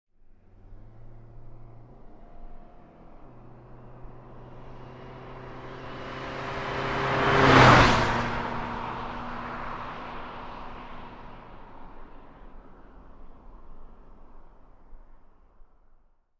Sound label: Nissan Sentra Fast By, compact